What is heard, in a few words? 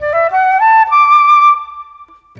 Flute, Orchestral Series Rock and Pop